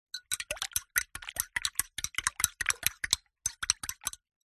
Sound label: spoon stir hot water tea or coffee in mug; food drink; zapsplat